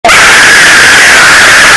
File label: jumpscare; one night with cuphead 1